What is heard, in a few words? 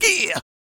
YELL, MALE HUMAN; Spectrasonics Vocal Planet